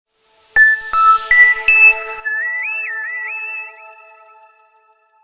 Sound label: Dulcet Tone; Coolpad 7296s; ringtones